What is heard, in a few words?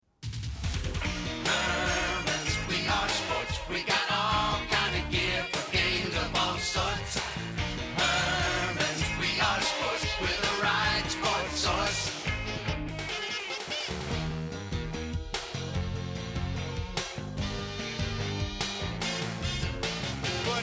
jingle only multiple holes